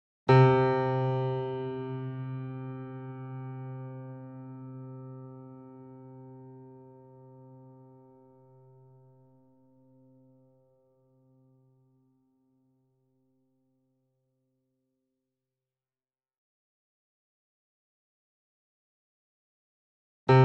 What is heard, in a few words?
C3 E3 A3 Grand Piano sustain; Sound Sampling Collection VOL-3; Grand Piano 88